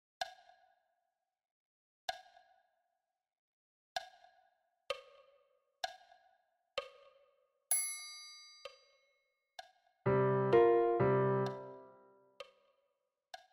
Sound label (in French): piano solo a 64 bpm